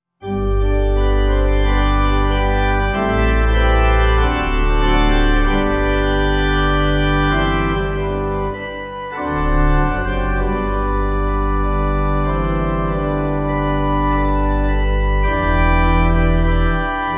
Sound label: My heart Is Full, Pipe